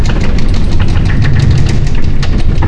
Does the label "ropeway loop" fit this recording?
Yes